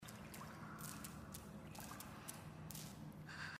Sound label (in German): seil klettern; inside